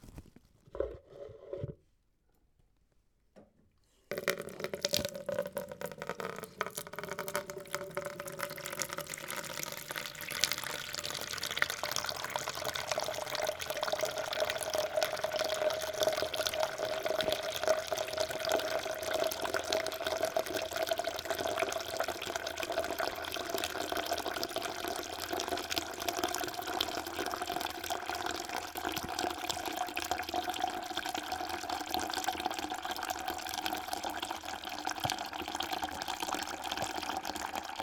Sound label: Water